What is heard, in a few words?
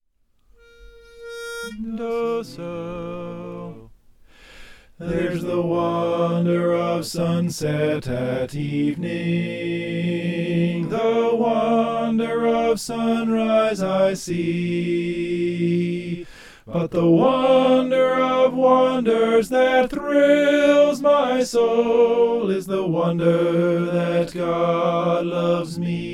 soprano